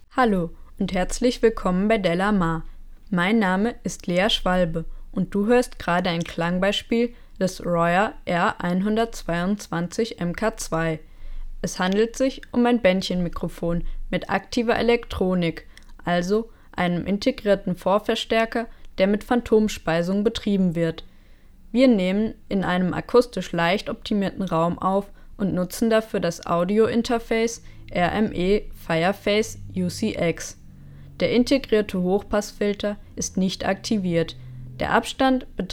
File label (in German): vocals rueckseite 30 cm; royer r-122 mkii